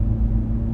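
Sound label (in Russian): короткий быстрый тихий звук проезжающего автомобиля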